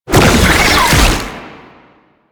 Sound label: Proto Infested Hover Start; Warframe; Brachiolyst; Abilities